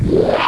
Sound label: Vortex Open; Cyclone II